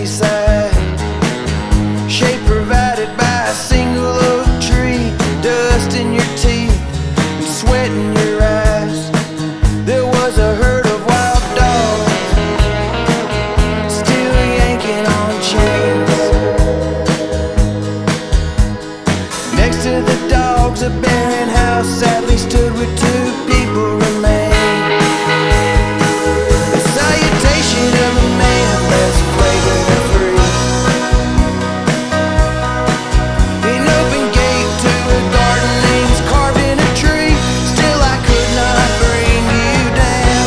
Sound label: Acid Falls Blues